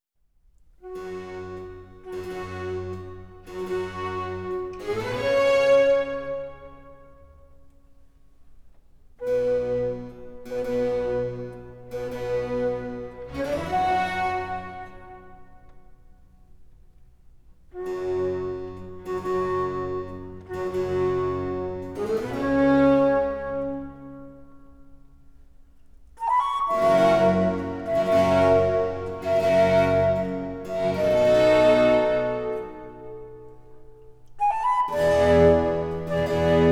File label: Concerto For Flute And Strings In G Minor, Largo; Antonio Vivaldi; Op.10, No.2, R.439 ''La Notte''